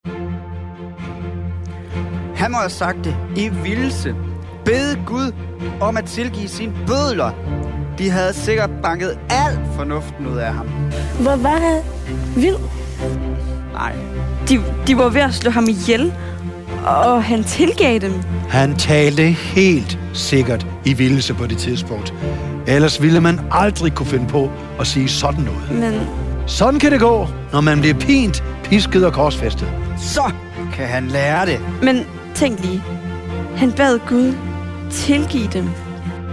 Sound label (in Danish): Drama musik og drama